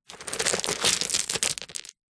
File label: foley pebbles many movement; zapsplat